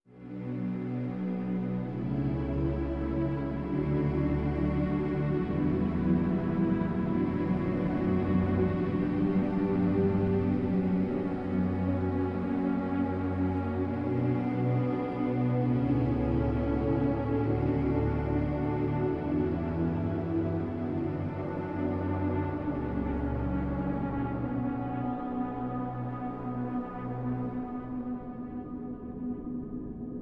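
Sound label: Ambient Requiem; Shadowgrounds; windows gamerip 2006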